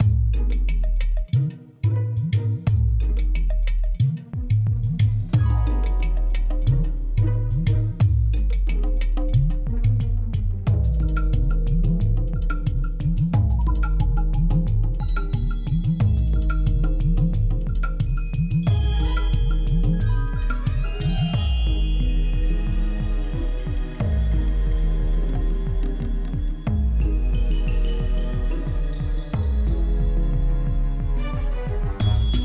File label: Icy; color guardians macos ps vita ps4 windows gamerip 2015